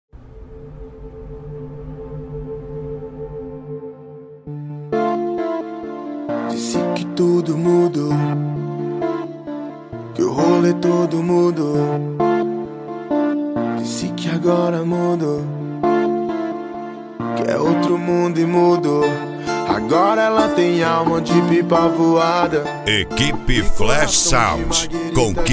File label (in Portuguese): rap; cd rap flash sound